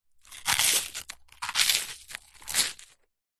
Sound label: human eat bite crunch munch toast; zapsplat